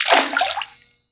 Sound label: fs fm water, footsteps; Portal 2